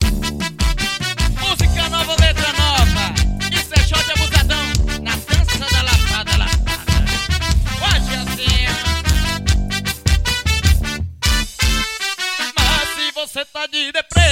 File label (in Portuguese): forro xote